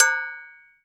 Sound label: Cow B. Med, EMU Orbit-3 drum machine; 300 Drum Machines